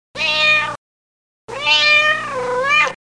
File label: Kitten meowing for food